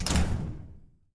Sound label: locker close